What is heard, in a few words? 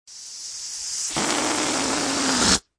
balloon fart; Sound FX Real Life